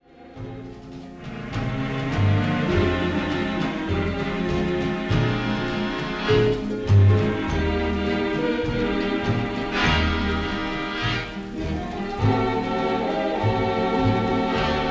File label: Instrumental